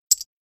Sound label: chip put down chip